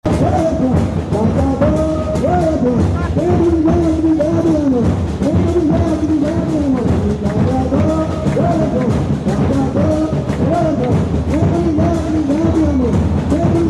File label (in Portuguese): tocador; estrela brilhante